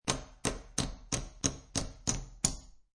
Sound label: Poker Chips Place Chips On Top Of Stack One By One; Gambling